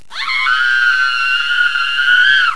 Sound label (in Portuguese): grito, sons humanos